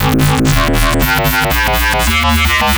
Popwow Bass, Drum n Bass; KJ Sawka; Drum n Bass & Beyond

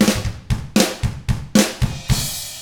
ROCK

